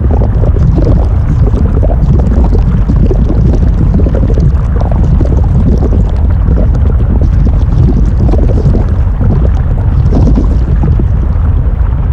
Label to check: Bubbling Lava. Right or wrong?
Right